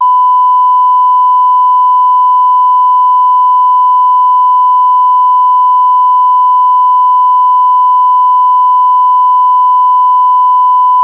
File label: 1kHz, test tones